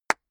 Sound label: clapping very slowly